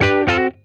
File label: G-D PASS, Funk Guitar; Zero G - Funk Guitar